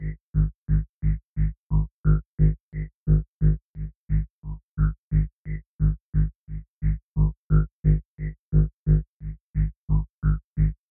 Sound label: bass processed; bawu